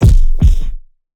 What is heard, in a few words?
Punching Perc, Bag Drop; 99Sounds; Punching Percussion